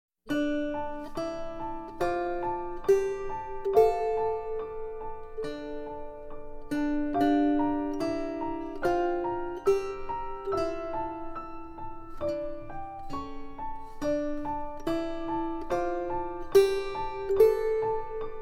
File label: piano and guitar version